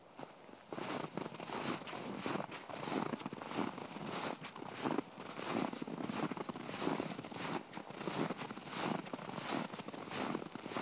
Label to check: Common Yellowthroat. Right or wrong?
Wrong